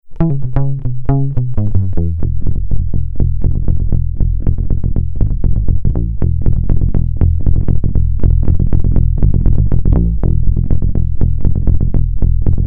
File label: Bass Pick